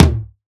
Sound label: Terror Tom, Dubstep, Percussion; Drums; One Shots; Cymatics; Dubstep Starter Pack